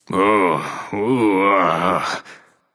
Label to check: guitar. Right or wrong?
Wrong